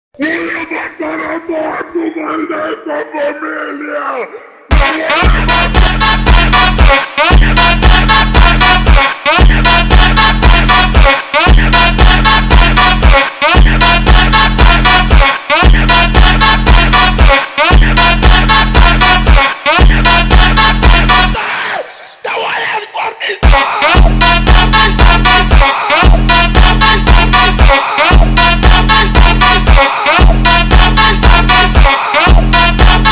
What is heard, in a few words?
funk slowed speed up; tiktok version